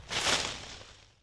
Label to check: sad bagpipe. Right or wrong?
Wrong